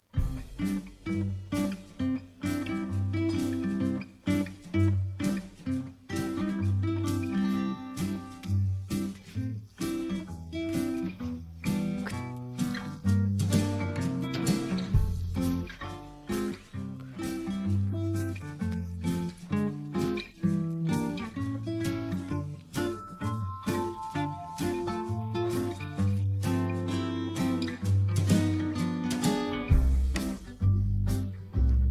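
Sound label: backing track; pesnya dlya detei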